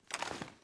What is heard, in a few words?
reload fast raise, sh; reloads; iw8; romeo870; gmod